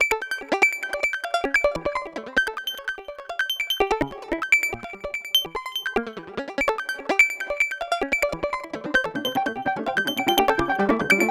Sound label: Arp Crazy G3; Zero-G - Ghost In The Machine